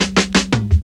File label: fills; Sound & Vision - Gigapack I